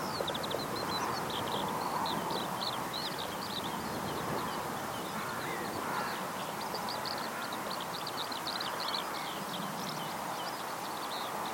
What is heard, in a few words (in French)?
alouette des champs; fresnes smarne